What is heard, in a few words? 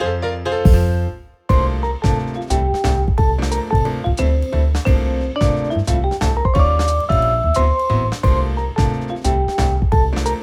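BGM Trump Table Round Result Draw; clubhouse games 51 worldwide classics 2020 switch gamerip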